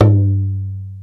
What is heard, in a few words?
INDIAN, DrumBase; Crypton - DrumBase5000